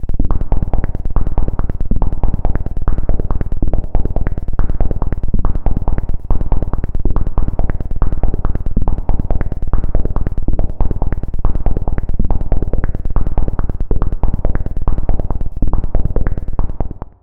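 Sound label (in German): Pocket Operator Modular 400 ENV auf Filter VCA, Synthesizer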